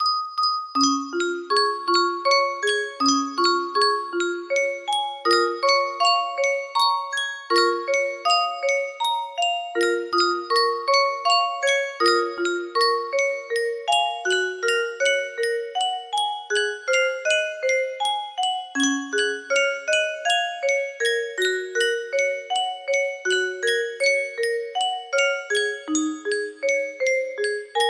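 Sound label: choral arrangement, music box melody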